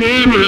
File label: loop (baby)